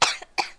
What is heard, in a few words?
Boy Idle; In the Line of Duty Firefighter